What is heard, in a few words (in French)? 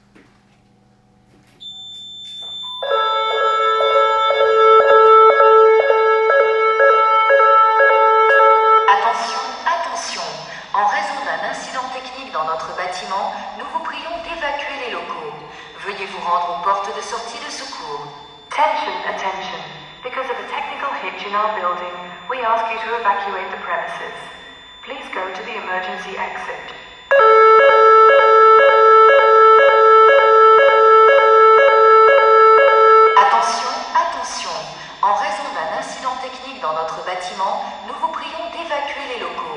incendie